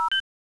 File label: plasma ready